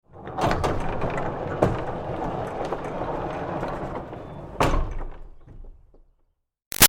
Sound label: Door Barn Rolling Long Roll; Barn Doors